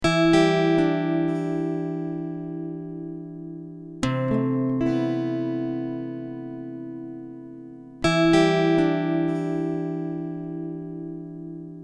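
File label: Em 120 guitar arpeggios harmonics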